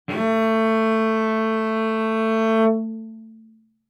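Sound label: Cello arco fortissimo sul D A3; MIS Pitches - 2014; Strings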